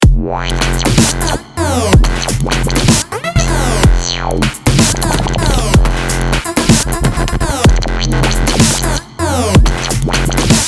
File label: Smashing Dubstep